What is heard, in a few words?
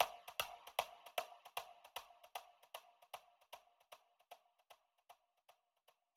FX Impact; 99Sounds; Monosounds